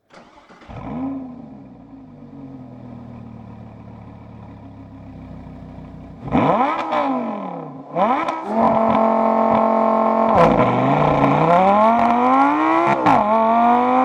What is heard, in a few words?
Lamborghini Gallardo LP570-4 Superleggera